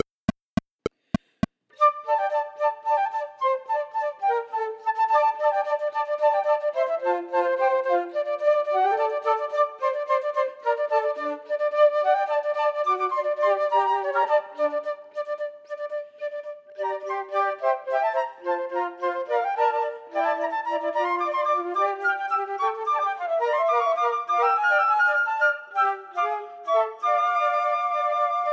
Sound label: Quartet minus 2nd part; Dvorak